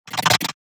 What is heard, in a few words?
Emote; Evolve; Gorgon